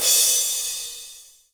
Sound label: drum hit finish